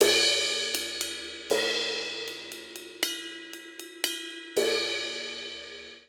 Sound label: Straight Three Rock Cymbals Only 3/4 118 bpm; MIDI Clips; Drum; Ableton; Factory Packs; Drum Booth